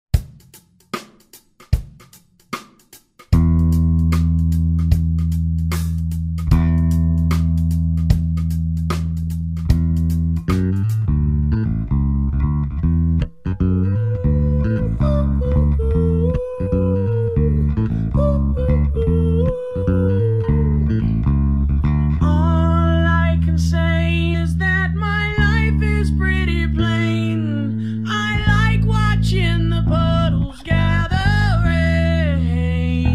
backing track

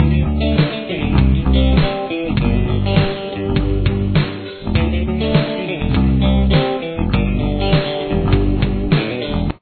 interlude

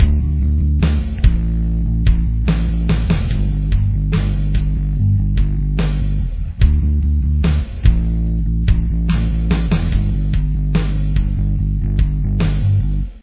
bass chorus

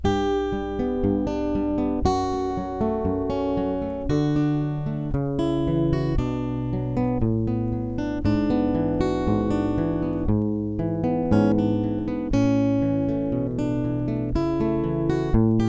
chorus, guitar

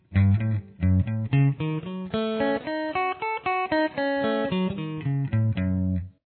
Arpeggio Em6 style

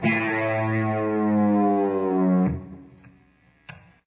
dive

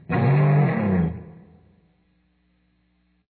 slide, guitar

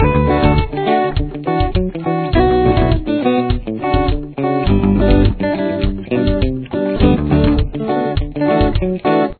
chorus, guitar

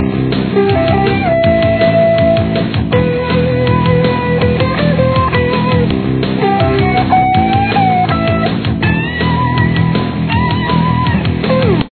lead, guitar

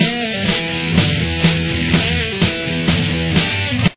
intro guitar

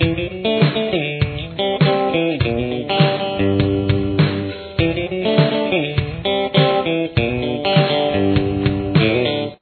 interlude guitar

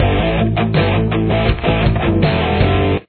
chorus main, guitar

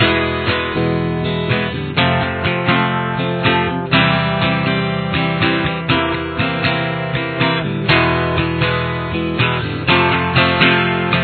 chorus, guitar

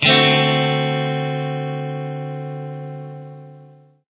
d dominant 7th open, guitar

